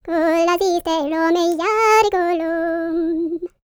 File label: PERSIA, World Voices, Vocal; ILIO; Vocal Planet VOL-5